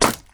Bullet Hit; Angry Goy II; SoundEffects

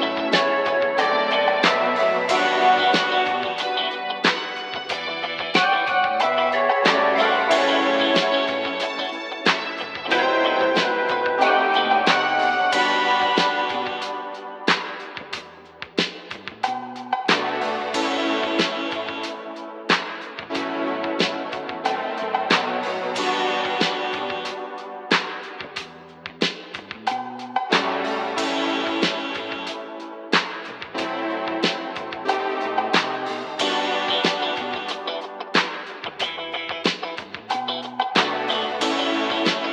elevator music; Angry Goy II

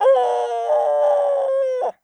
Death; Angry Goy II; Muslim

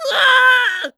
Death; Angry Goy II

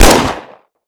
Light Carbine Shot; Angry Goy II; SoundEffects

